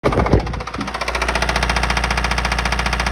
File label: valve cover possibly broken, Mazda6